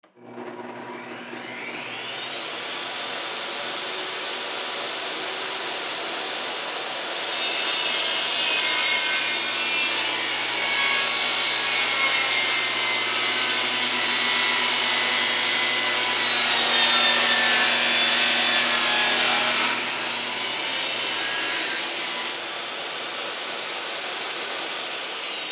Table Saw, Table Saw Rigid 10 In Turn On Run Cut Pvc Pipe Turn Off; Saws; Industry; Blastwave